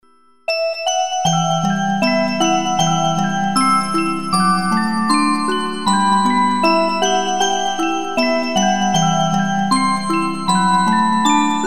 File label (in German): Orgel